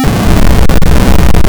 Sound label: FOOT SLAM; Keen 3